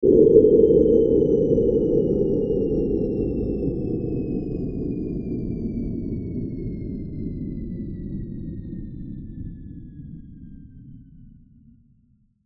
Descend Power Down Long; Descending Sound Effects; Blastwave

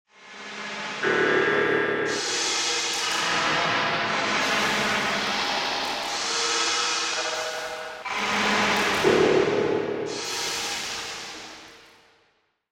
Inner City Insectoids, alien sound effects; science fiction sound effect

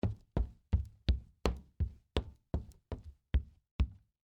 Footsteps Stairs Wood; Human

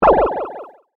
Laser Beam Ray Gun, Stun; Lasers; BlastWave

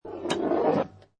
Pinball Sound Ball Roll And Hit Object Midways; Video Game; Video Games

